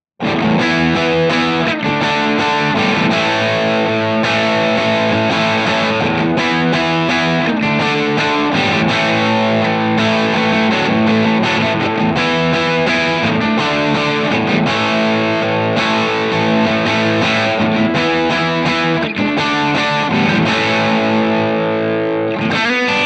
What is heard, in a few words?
crunch; jensentone